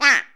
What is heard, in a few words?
DUCK; Zero-G Ambient 1; WHISTLE FX